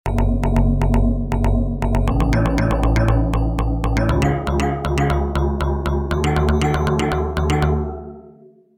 synth, Roland JV880

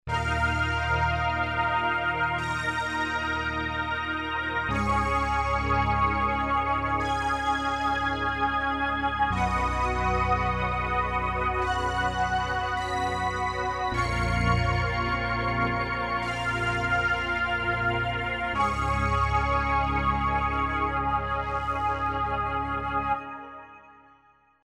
pad, yamaha tg500; Pad: digital hope

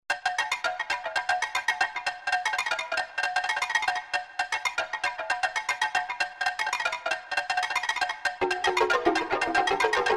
perc africa, Percussion: ethno, Yamaha TG500

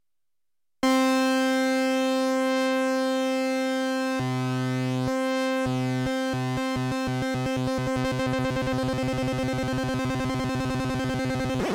all LFO reverse, Kawai sx240

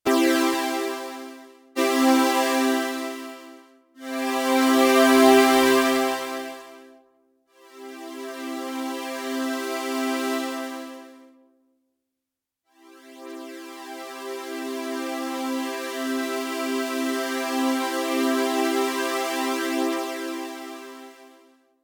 adsr, Creamware minimax

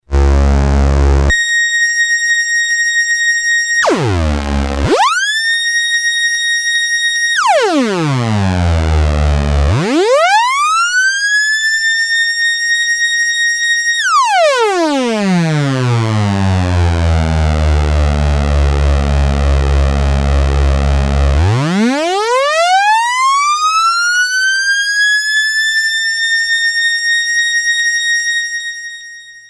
portamento, novation super bass station

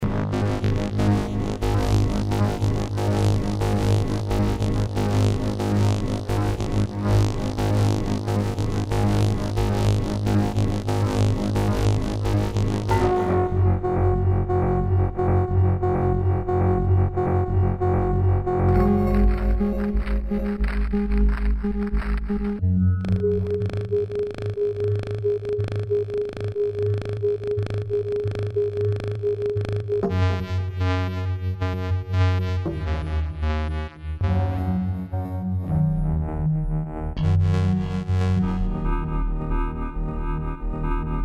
yamaha tg33

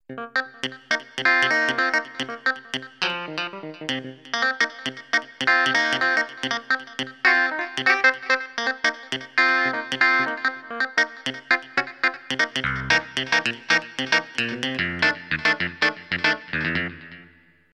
clav funk, Clavinet: D6 funk, Yamaha TG500

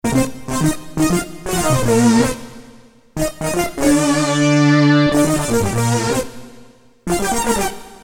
synth lead vintage, yamaha sy99

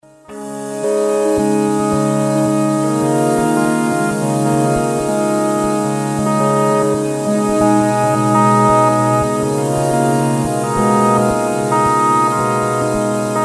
pad time, synth roland jd800

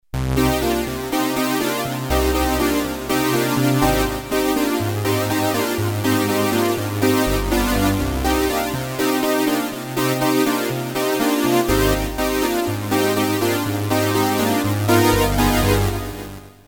synth brass, Synth: brasssynth, Roland JV2080

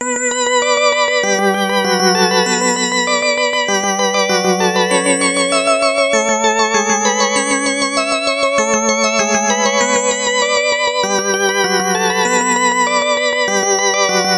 synth, yamaha tx81Z; light

